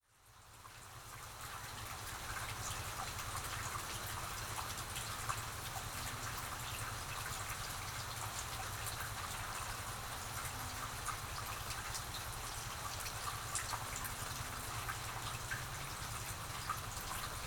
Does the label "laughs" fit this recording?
No